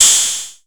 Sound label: MS20 RIDE; INIS - Funky Xtreams; MS-20 KIT